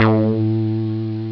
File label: mt32 syn bass; Amiga Soundtracker Sample Packs